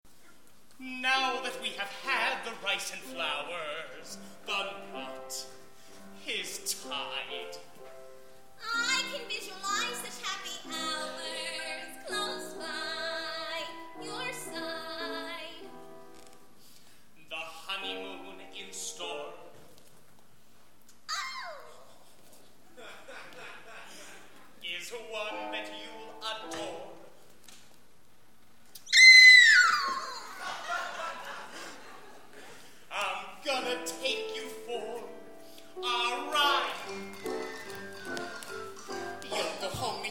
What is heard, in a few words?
shuffle